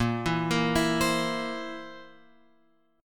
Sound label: Bb Suspended 2nd Flat 5th Bb down Guitar Open F; arpeggiated